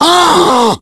pain tank

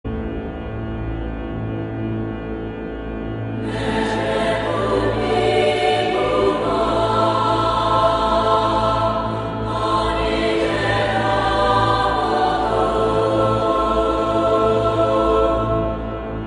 win zombie

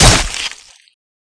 sword hit; cstrike

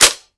kriss clip in

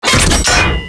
nuclear ability

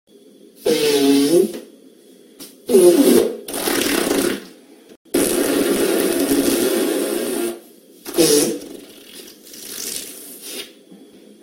fart

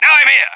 whack popup; cstrike; 35hp ruso

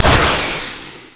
acid launch

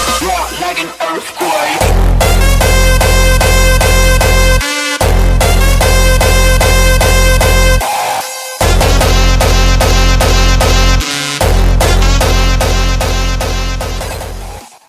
electro; roundsound

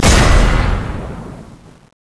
razor punch

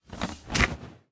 acidtrap knife deploy

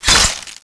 icicle damage

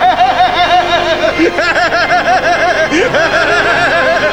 laugh

